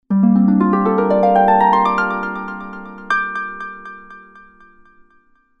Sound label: Jingle